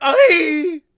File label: Death Vox; Civ4 warlords